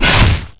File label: zombie wall; left4dead2